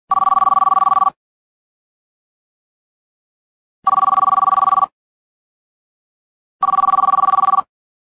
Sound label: ring